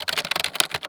Technology Electronic Keyboard Computer Keyboard Typing Fast